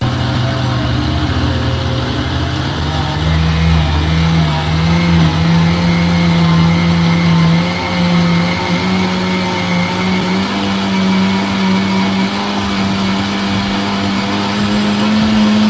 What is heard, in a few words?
gaming; ducky